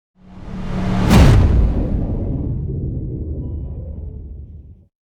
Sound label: Riser Hit